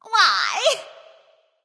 grunt hit; smite; voicepack; medusa

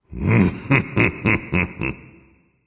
"heh heh"; smite; voicepack; ullr

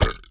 idle chestbeat; smite; raijin

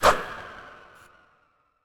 swing; smite; cabrakan

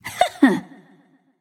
hehheh; smite; pele

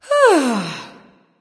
nv sigh; smite; awilix

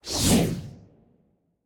lobby vignette close; Smite; Baron Samedi

